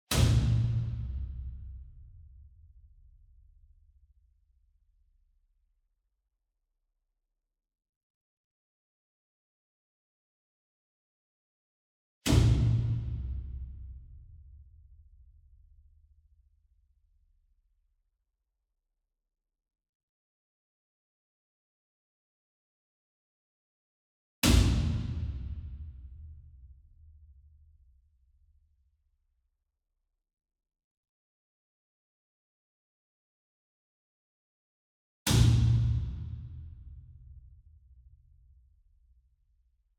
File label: SINGLE DRUM Taiko 60 Inch Hit Whip Beater Far; boom library; Cinematic Strikes Construction Kit